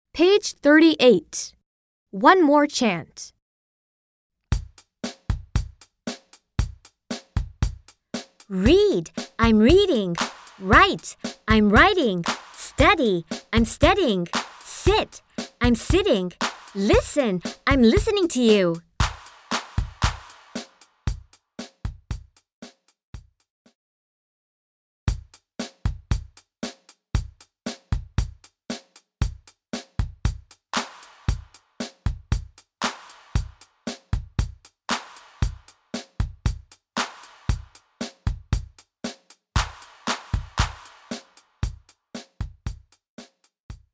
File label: One more chant